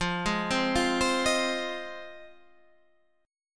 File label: F Minor 7th F Guitar Standard; Fm7 arpeggiated